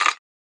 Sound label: Talk Percussion Shot; Cymatics - Astro